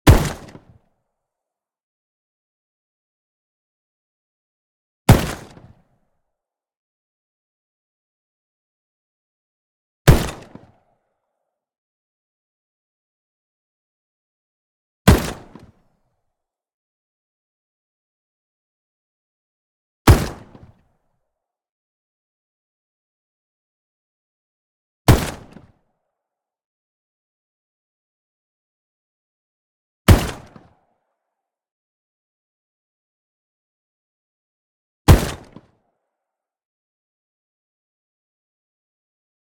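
Gun Shotgun Saiga 12 Cal.12 DESIGNED Single Shot Core Long; Stereo; Dramatic Cat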